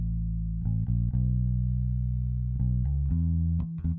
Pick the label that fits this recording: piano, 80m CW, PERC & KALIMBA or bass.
bass